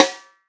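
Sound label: snare drum, Real Drum Kit; Best Service ProSamples vol.43; POP KIT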